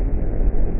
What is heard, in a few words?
doors